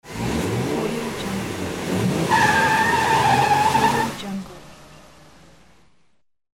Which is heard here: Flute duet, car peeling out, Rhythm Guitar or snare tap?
car peeling out